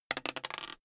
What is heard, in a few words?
die roll table long